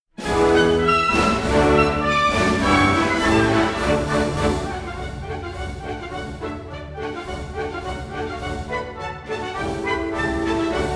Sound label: Grands Battements Devant, exam music